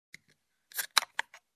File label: Soda can opening